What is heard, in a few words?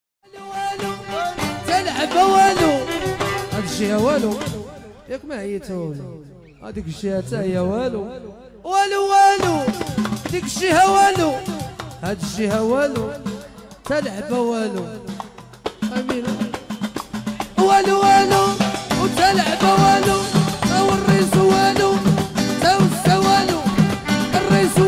Chaabi Ambiance